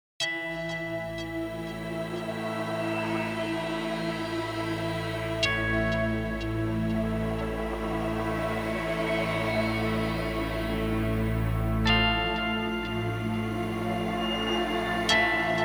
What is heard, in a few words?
Electronica Soundscape